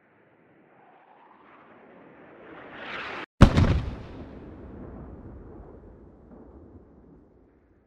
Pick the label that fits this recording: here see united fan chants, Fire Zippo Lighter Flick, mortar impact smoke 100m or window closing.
mortar impact smoke 100m